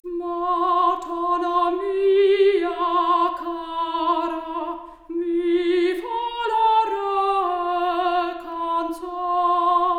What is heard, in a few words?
Soprano Stereo output; 4bit